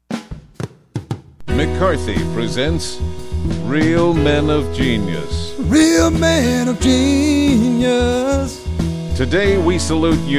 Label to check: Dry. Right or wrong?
Wrong